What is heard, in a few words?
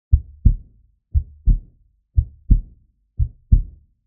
heart beating at regular pace loop